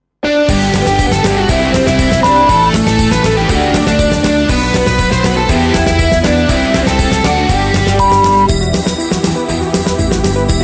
XMas Rock Pop A 120

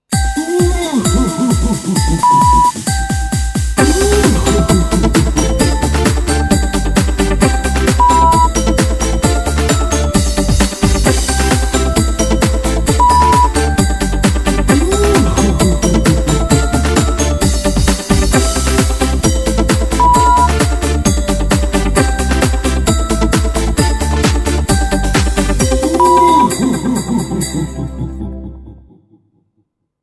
XMas Dream Dance, 132

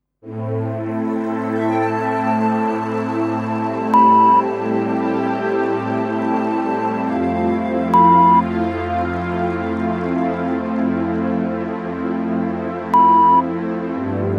Meditation Rain Dreams E 70bpm; Meditative, entspannende Hintergrundmusik / Meditationsmusik

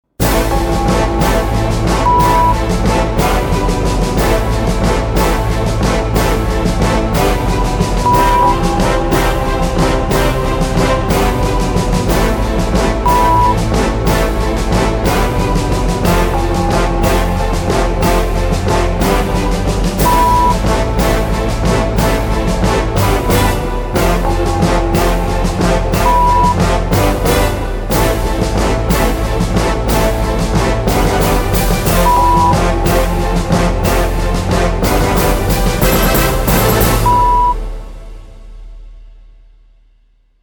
Battle of Gladiators 182bpm

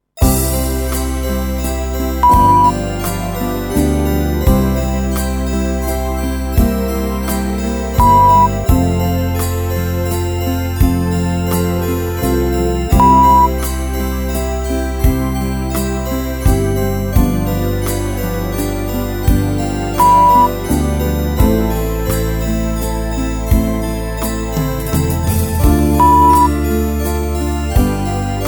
XMas Slow Walz 85bpm; unbeschwert

